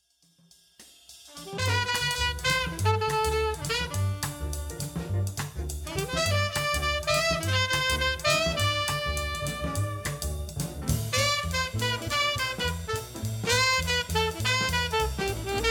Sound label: jayne melody